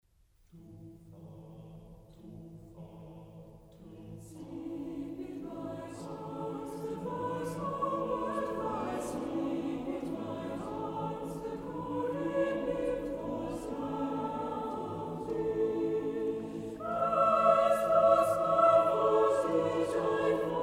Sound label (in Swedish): Koreansk folkmelodi Lullaby; 斯德哥尔摩; 外文宗教歌曲